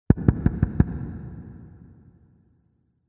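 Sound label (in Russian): постукивают в большом зале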